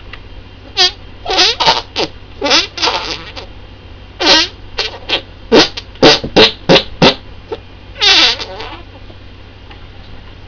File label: fart